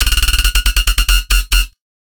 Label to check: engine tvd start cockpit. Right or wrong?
Wrong